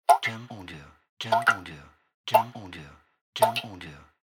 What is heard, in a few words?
Drops